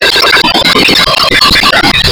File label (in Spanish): Salsa